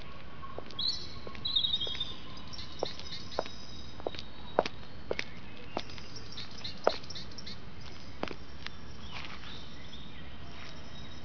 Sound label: steps; Broken Sword 1 - The Shadow of the Templar (CD, Spanish); ScummVM